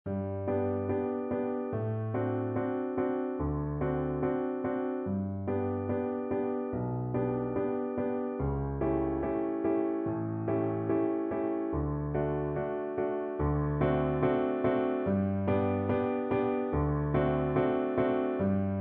medium voice accompaniment; Grade 4; Introduction to Runs

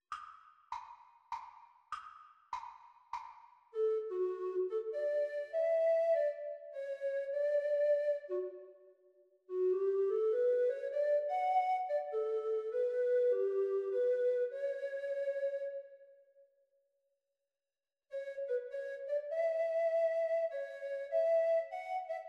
Treble Recorder; Grade 6; practise speed

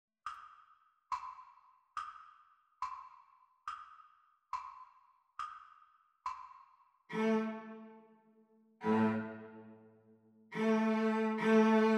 Cello Duet Playalong On Parade; Practice Speed

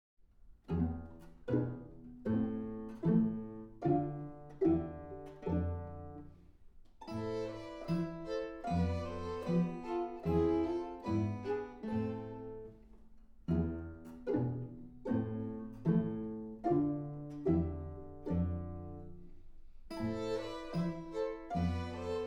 with guitar

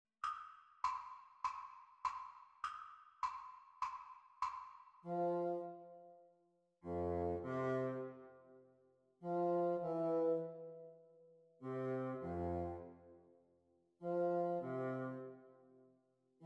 Bassoon Duets; exam speed

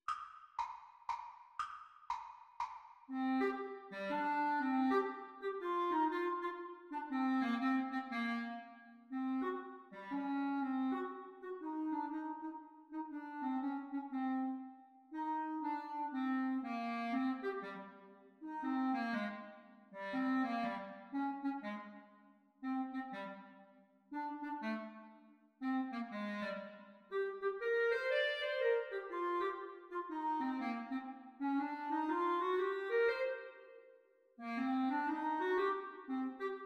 Exam Speed Recording Clarinet Duets; Grade 2; The Teacher part

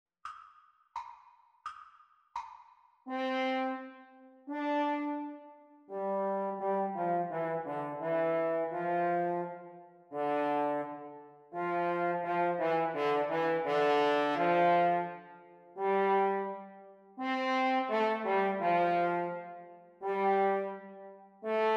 French Horn Duets Playalong

